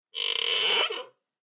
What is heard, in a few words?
Chair Squeak